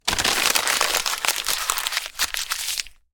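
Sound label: Paper Handle Paper Sheet Crumple; Mechanical Wave; Cardboard and Paper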